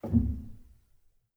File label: rel, Grand Piano; bitKlavier Grand; Piano Bar